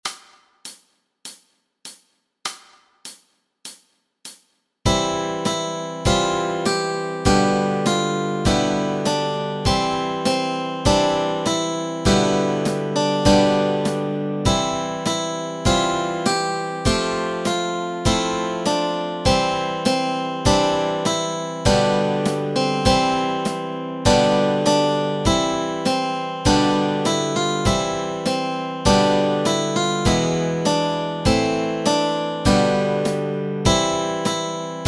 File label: Joyful Joyful We Adore Thee melody and chords